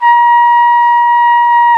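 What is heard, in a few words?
BRS, Brass Trumpet; Roland L-CD702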